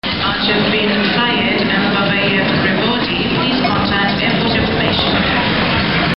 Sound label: airport